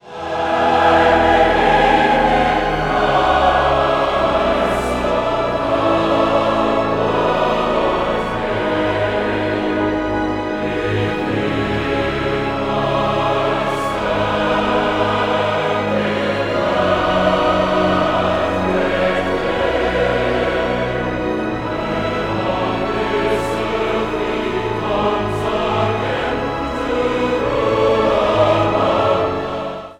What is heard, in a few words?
sung by the Choir